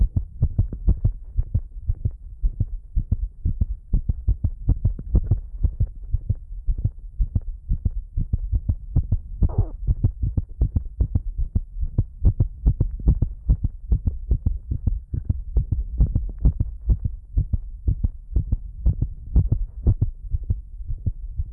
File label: PAROXYSMAL BIFOCAL VENTRICULAR TACHYCARDIA, Heartsound; Heartsound Library